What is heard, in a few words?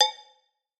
Synthetic Clang